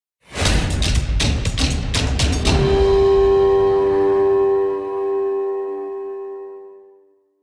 String; Assassin's Creed Unity Dead Kings

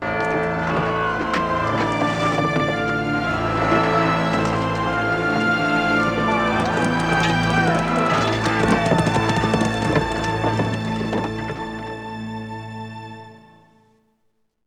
Rebellion; anno 1503 gamerip

